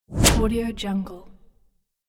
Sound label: arrow whoosh sound